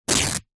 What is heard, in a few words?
clothes t shirt cotton rip; foley